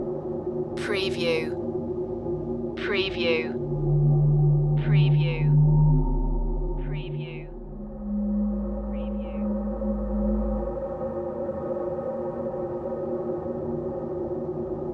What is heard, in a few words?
eerie atmosphere loop